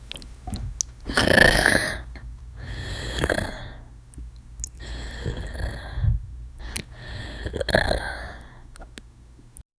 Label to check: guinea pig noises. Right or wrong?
Wrong